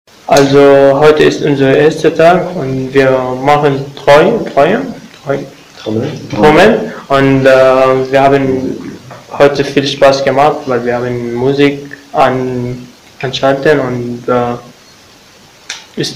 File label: Drums